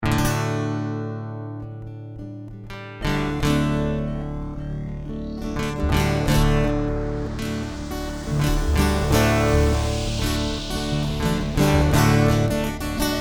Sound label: Instrumental